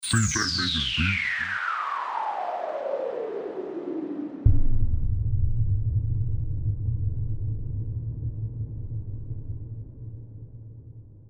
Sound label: 808 Beat